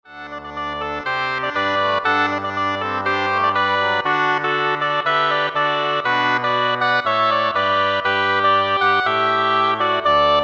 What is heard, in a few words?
Double Reed Ensemble